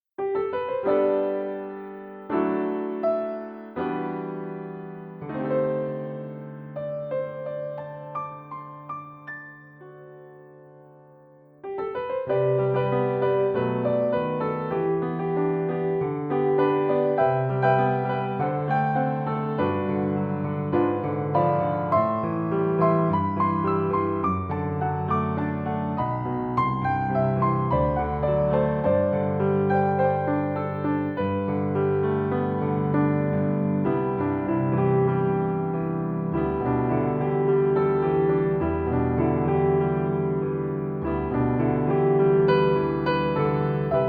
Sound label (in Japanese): ピアノ